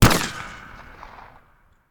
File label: Bullet Impact; Sound Ideas; Guns